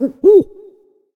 Snow Owl

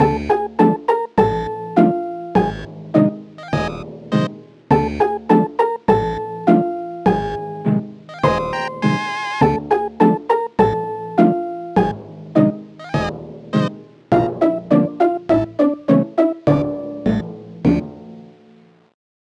Flashlight Game Music; Spookware

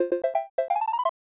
Unused Jingle; bs bdash; snes

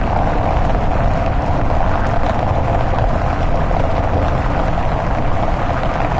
Vehicles Generic

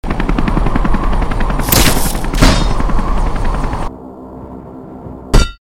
Sticky Hand; Infiltrating The Airship

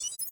button Interface; Timelock VR

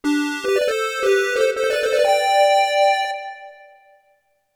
Bonus Game Victory (SC-55); fatal fury king of fighters; x68000

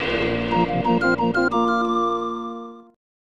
Level Up; Secret of the Stars; snes

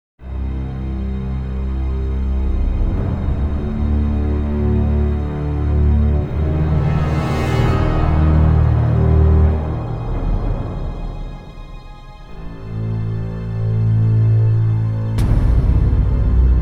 Dark Disturbing

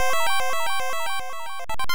Using Magical Item

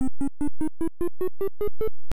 Youkai Appeared

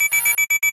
Time limit

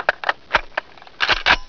Load Ak; Blockman Go; Android

